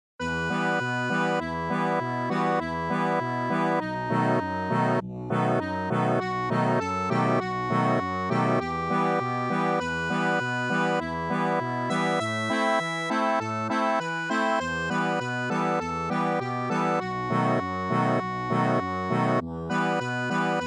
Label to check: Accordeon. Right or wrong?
Right